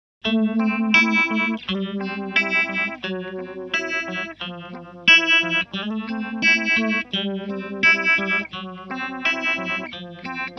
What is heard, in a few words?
vibe